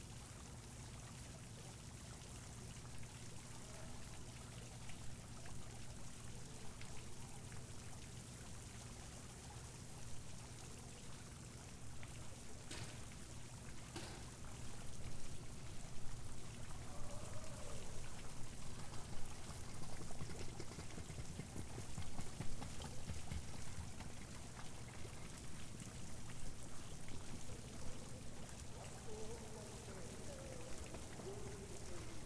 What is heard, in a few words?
fountain; Bosbyshell